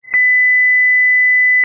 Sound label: square